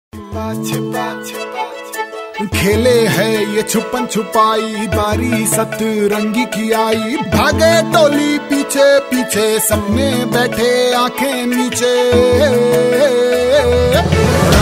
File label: LED TV ad song; Sony BRAVIA Triluminos